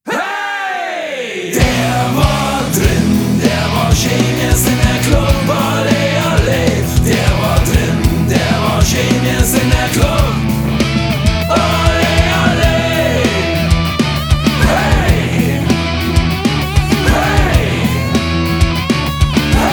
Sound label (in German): torhymne